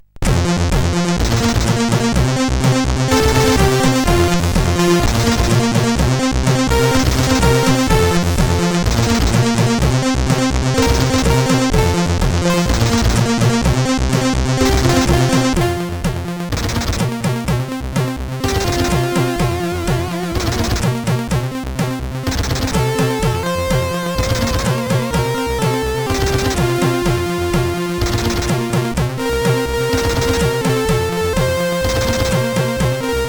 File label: sid CSG8580